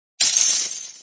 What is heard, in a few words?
get coin; texasbull